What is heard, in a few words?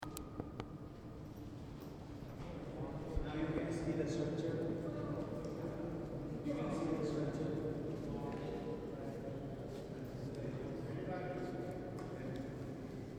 echo, Inde; tour du monde 2014